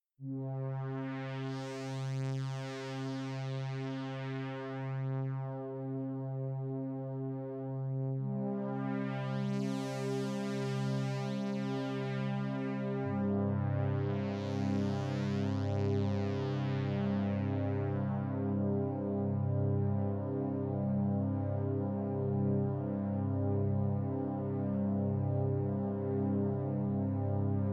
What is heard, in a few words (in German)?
Fahrstuhlmusik